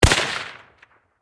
Warfare Rifle M1 Carbine Semi Single Shot Close Perspective; Rifles